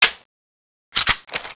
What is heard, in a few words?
clip in; tfa cso